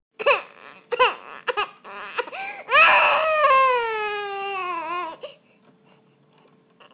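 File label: baby hungry, female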